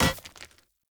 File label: Short Sword Medium Impact Rock